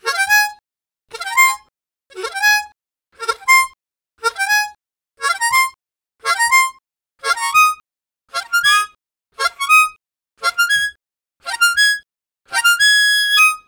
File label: Fours Up, Harmonica Riffs; Zero G